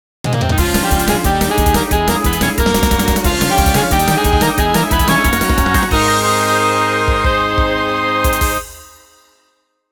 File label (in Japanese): ヤマメジングル